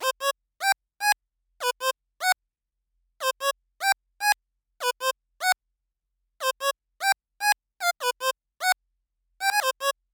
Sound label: Melody; chippy